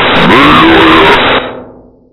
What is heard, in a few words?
zombie hgrunt alert